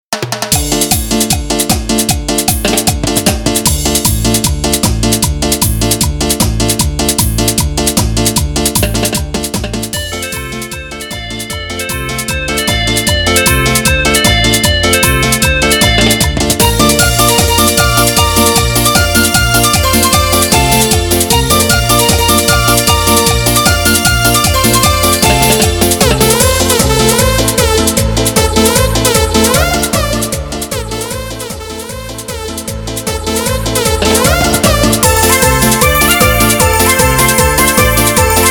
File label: Instrumental Chicha BPM 153; Dimax Dj Producer